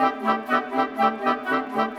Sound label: Winds, Orchestral; Sonic Foundry (Sony Creative Software); Orchestral VOL-2; Modern 20